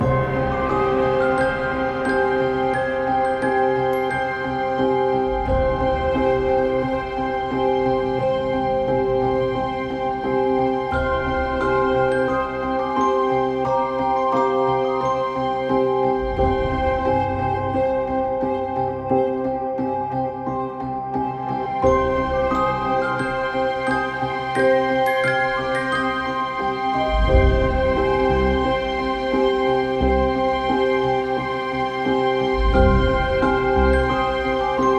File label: Winter Ambient Night Loop